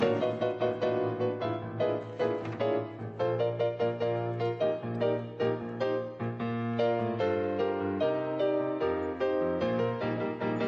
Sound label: playing the piano; peter griffin